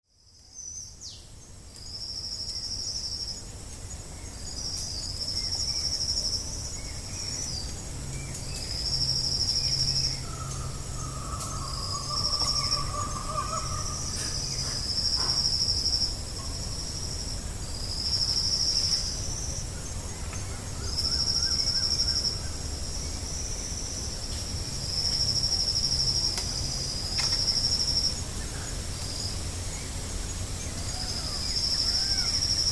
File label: soundscape sunset